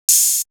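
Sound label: Hi-hat; 90 sSampleCDs; Coldcut's Kleptomania VOL1; Drum One Shots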